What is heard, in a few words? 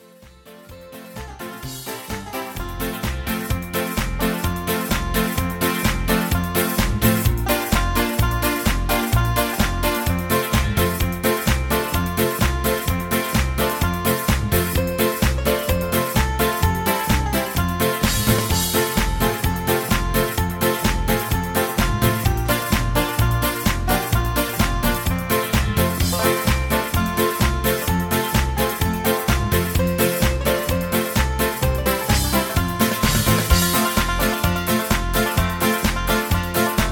Instrumental